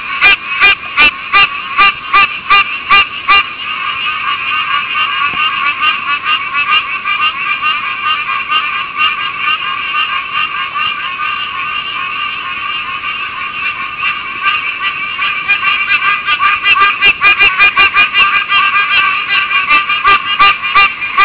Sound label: pine barrens treefrog